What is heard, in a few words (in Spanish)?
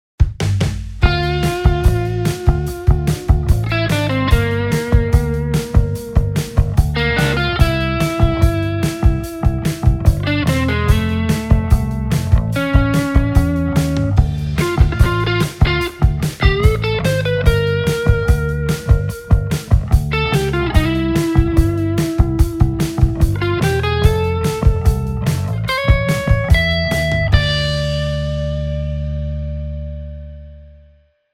jarabe, solo guitarra